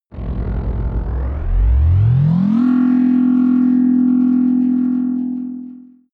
protective shield enable; energie

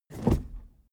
suv push forward gear across fast; verkehr transport; autos

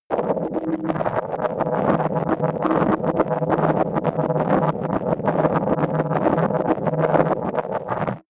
pitch jump rumble long, sciene fiction

